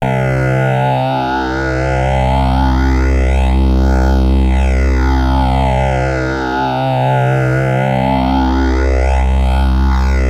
Access Virus B; Synthworx

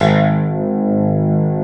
pick piano; East West - Global Sounds; S.I KEYS

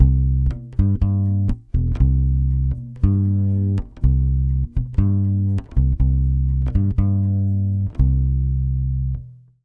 120 C7.9, Brazil Bossa; USB Soundscan; AKAI

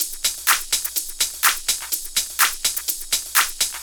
Drum Loops, 125 BPM

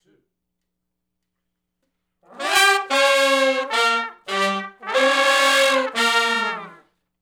R B Riff (Bb) unison, Horncraft; Sections

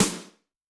Rock M, Yamaha DTXpress-II snare

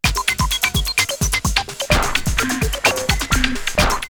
Voice Break 128, drumloops, Rave; X-Static Goldmine; 90 s Sample CDs

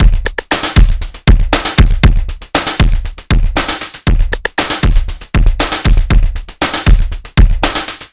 Drums Strange

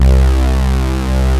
SAW; Pro-Rec - Synthsonic

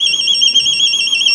VIOLIN BIRDS; Best Service; World Colours

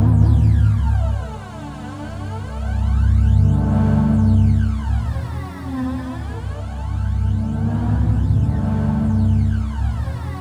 C2, Synth Leads

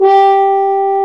BRS F HORN, Mute-Stopped; Roland L-CD702; F.Horn Solo

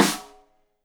Rim Shot Stereo; Deeply Sampled Drums; SMDrum

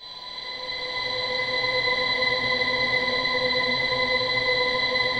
WIND WHINE; Distored Reality

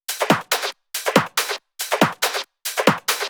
Loops 140 BPM Hihat Percussion Loops

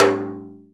RIM, Timbale; Timbale Battery

